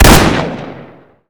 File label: shotgun shot; casualtiesunknown